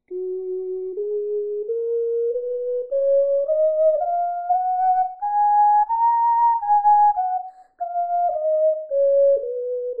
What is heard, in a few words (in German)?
Fis Tonleiter, ocarina